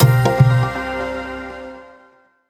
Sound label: prize scatters; Great Book Of Magic Deluxe; Wazdan